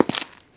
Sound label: footstep concrete